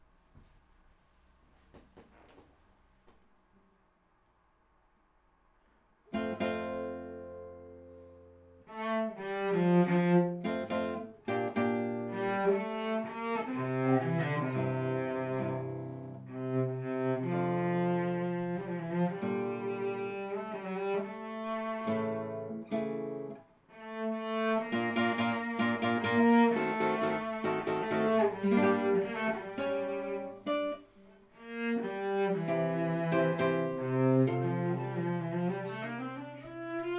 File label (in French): Cruda sorte pour guitare et violoncelle